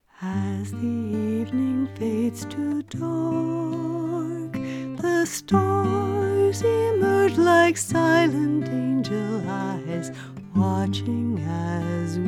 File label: Guitar 5 parts